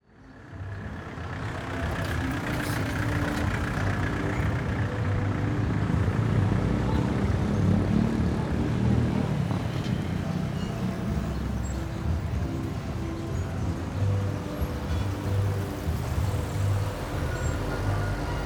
AMB EXT DIA CIUDAD Mercedes Corrientes ARG Generico transito

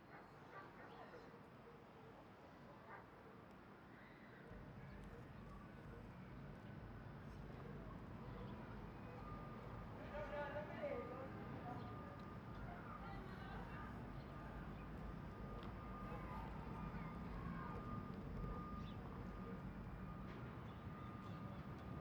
AMB EXT ATARDECER PLAZA Paysandu UY

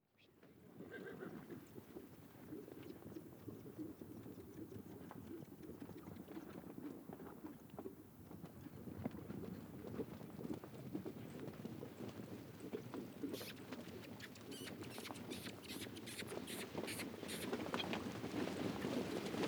TROPILLA de CABALLOS pasan voces arriando

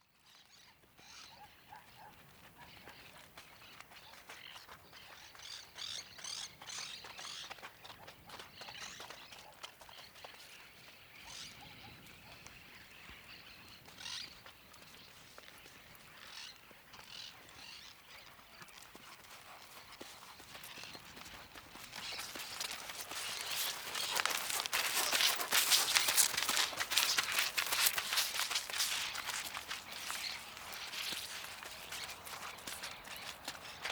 CABALLO paso trote respiracion estribos

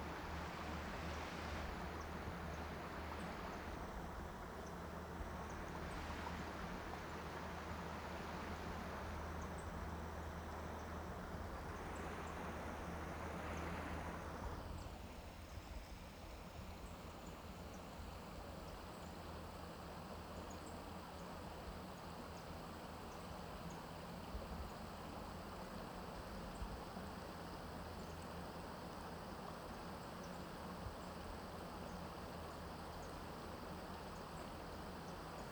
AMB EXT AMANECER PUENTE Valle Eden UY Generico arroyo

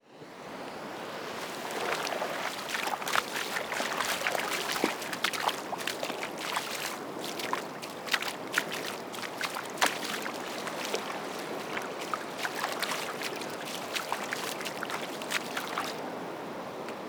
PASOS sobre AGUA orilla playa

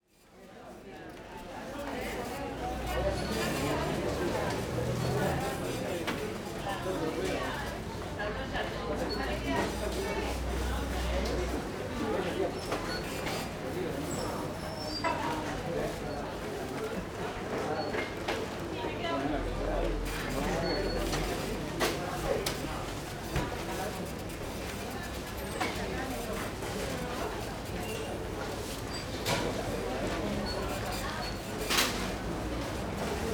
AMB INT SUPERMERCADO puerta de entrada

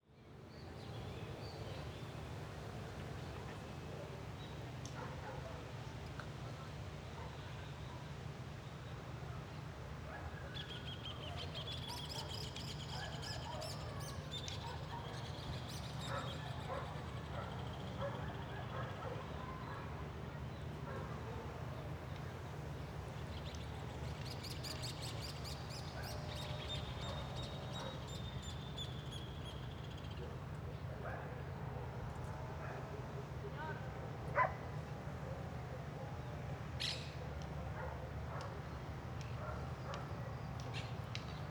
AMB EXT DIA PARQUE CIUDAD UY pajaros transito leve

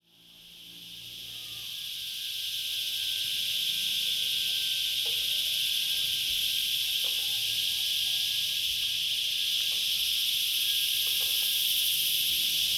AMB EXT TARDE CIUDAD Corrientes ARG Chicharras en patio de Iglesia

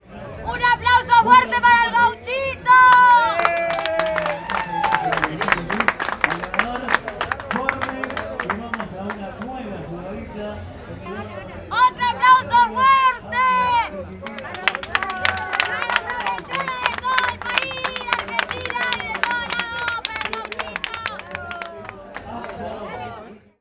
AMB EXT DIA SANTUARIO Mercedes Corrientes ARG Grito arengando; Gauchito Gil; Grito arengando al Gauchito Gil

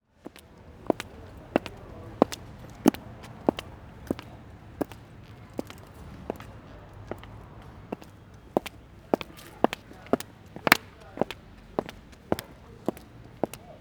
PASOS con TACOS

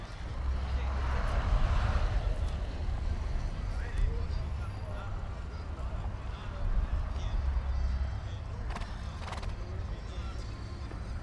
AMB EXT DIA CAMPING Entrada al camping; Gauchito Gil Corrientes ARG

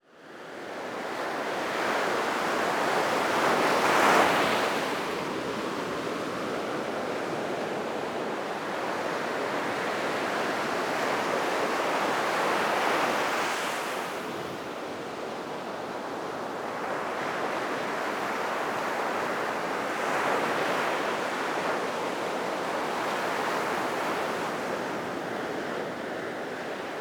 AMB EXT DIA PLAYA Garzon UY Mar olas

